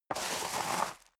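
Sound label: step boots gravel medium slide long, footsteps; schritte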